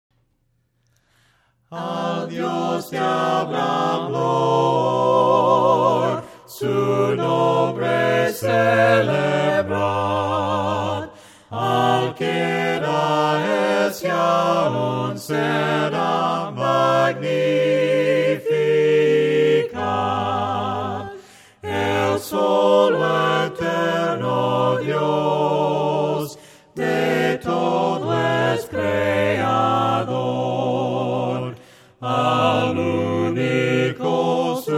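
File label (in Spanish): VOCES CANTADAS, himnos a capella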